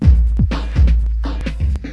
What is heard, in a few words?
kick beats